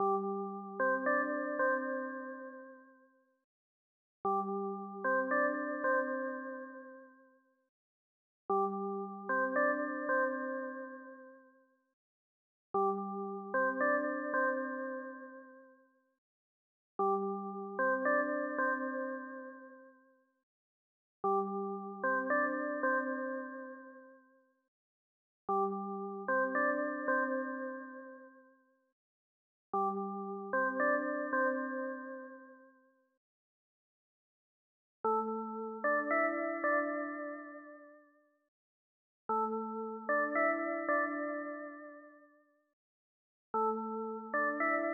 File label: lead 1